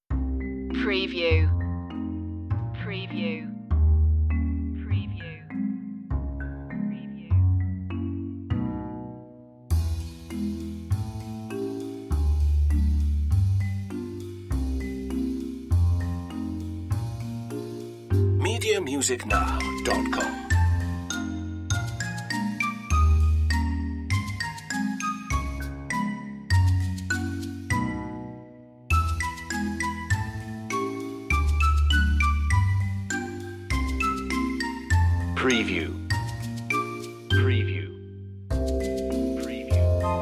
no strings pizz chords

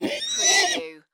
Horse Stallion Neigh; Sound Effects Bible

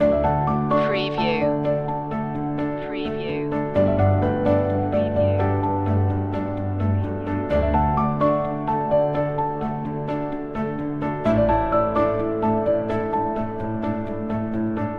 how far can we go loop

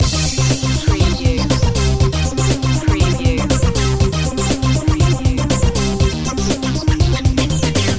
retro electro loop

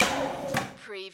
Electric Switch

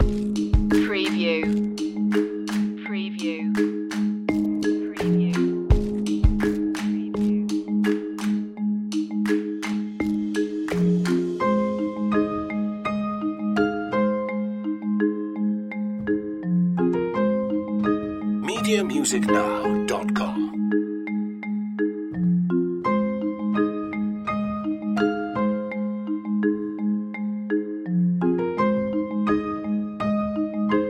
spring to life loop

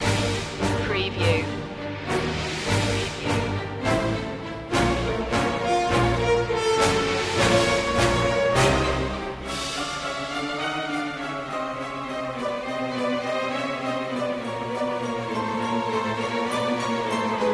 no choir

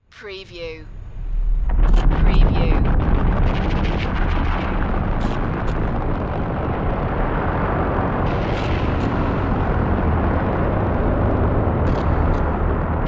spacequake

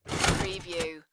Slot Machine Handle Pull

